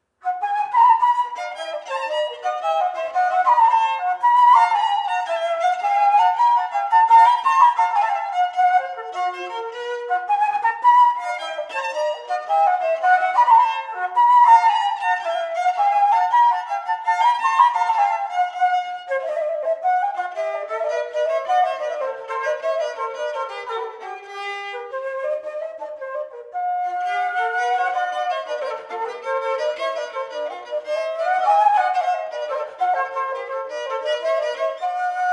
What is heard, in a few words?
Suitte C-dur Gigue; Hotteterre; op 8 movement 9